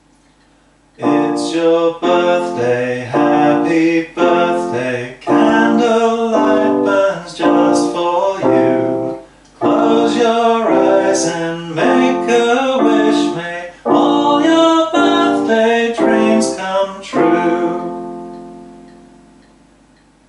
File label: Its Your Birthday Vocal; Musicals